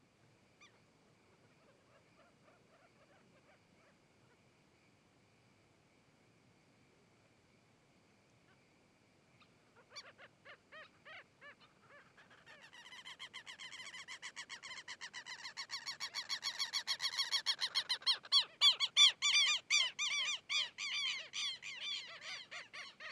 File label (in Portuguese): Garcas se aproximando, passando em frente ao microfone e saindo; animais